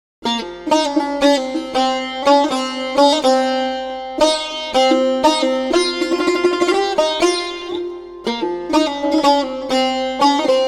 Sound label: Tar